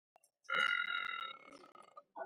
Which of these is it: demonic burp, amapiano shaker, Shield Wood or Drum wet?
demonic burp